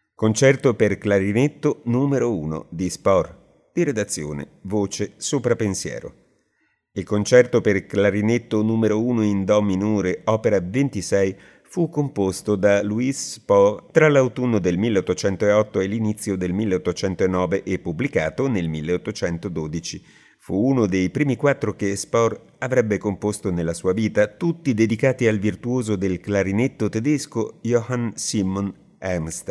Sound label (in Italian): Concerto per clarinetto n° 1; Louis Spohr; Concerto per clarinetto n° 1 in Do minore, op. 26